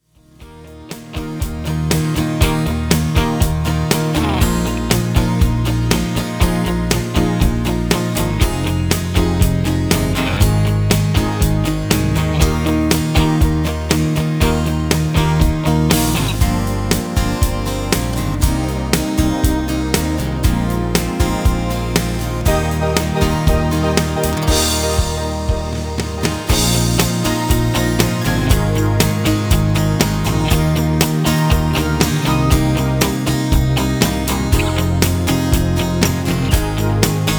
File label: Instrumental